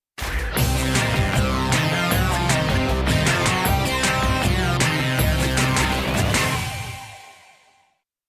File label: Theme; Wario